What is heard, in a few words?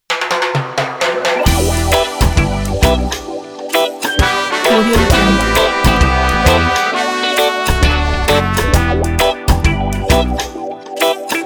cool chill reggae